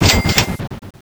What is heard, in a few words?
money; cstrike